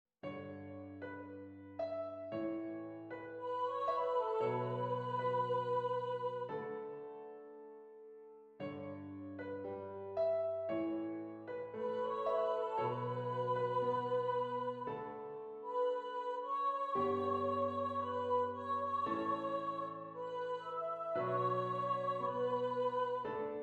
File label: Piano Vocal